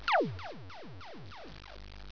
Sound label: laser; seagame